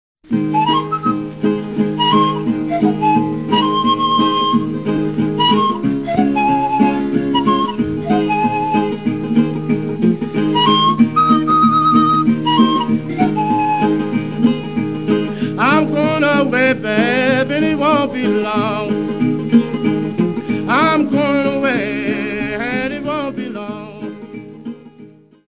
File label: Flutes de Pan